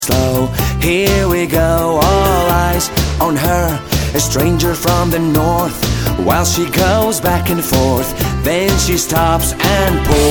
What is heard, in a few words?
banjo